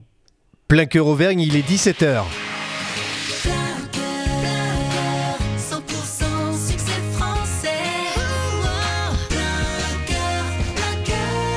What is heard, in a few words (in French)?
TOP HORAIRE NEUTRE; PLEIN COEUR; MOULINS